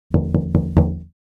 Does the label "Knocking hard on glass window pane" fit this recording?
Yes